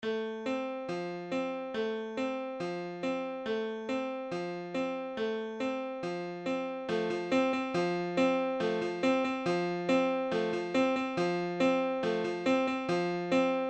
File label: Piano Madness